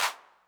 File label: Clap Accent, 808; classic machines